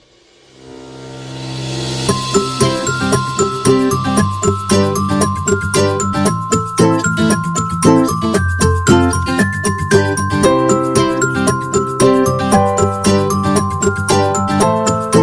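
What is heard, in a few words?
Food, Upbeat and happy background music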